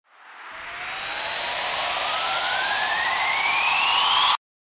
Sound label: RISER